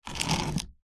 wooden box crate scrape movement on concrete

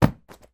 purse drop on carpet; foley

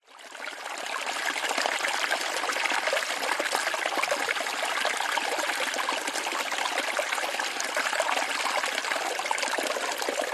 waterfall cascading water trickles between rocks; zapsplat

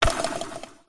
leisure toy plastic spinning drum spin weak

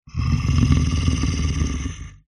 horror creature growl

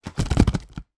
food cereal bar in box shake movement

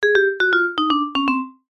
cartoon vibraphone descend steps; zapsplat

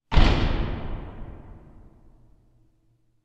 sound design big horror hit metallic cinematic

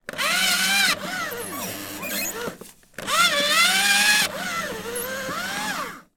office paper shredder overload struggle

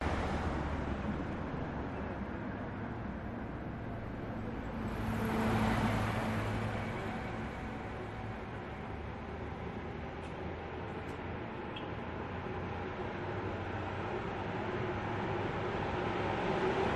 street traffic vehicles passing by; valle de las animas; la paz; your free sounds

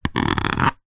foley plastic sharp object single scratch; zapsplat

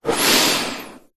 human breath in inhale through nose; zapsplat

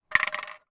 empty drinks can roll or drop on street; foley

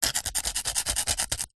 rock knife tip scrape scratch; foley

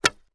medieval english longbow arrow shoot from bow; still north media

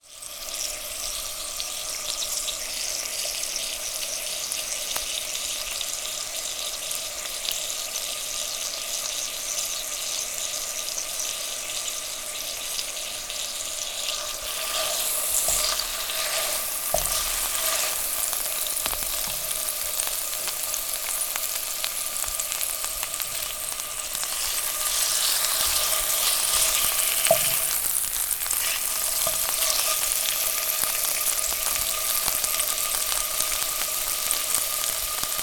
food cooking bacon in pan sizzle spit; zapsplat

